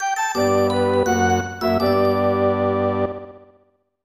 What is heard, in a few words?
"Muhahaha!"; Mystic Ark; SNES